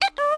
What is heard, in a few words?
Spider Fall; Cut the Rope